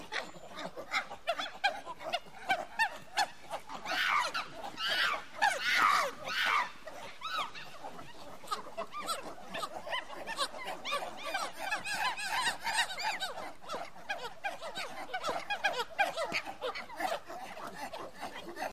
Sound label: monkeys Environment; jumanji